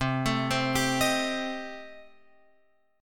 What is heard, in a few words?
C Minor 6th Guitar Open F, chord; Cm6 arpeggiated; JGuitar